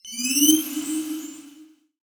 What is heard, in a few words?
charge, enemies; CrossCode; battle